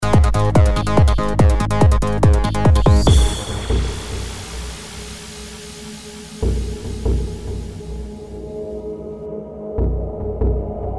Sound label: Tribal Glide